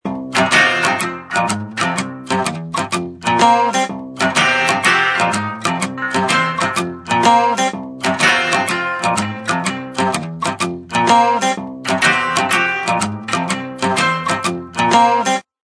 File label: Bluesy, Ringtone; HTC Smart